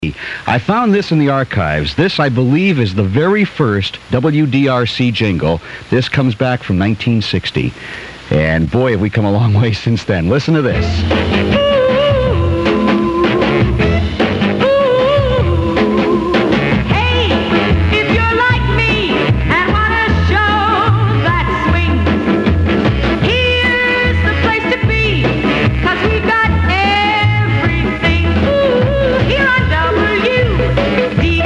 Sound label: jingle